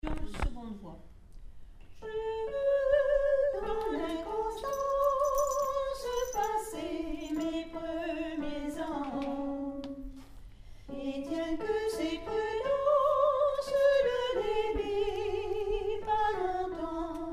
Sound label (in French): nocturne alto 1; Nocturne 31 - Berlioz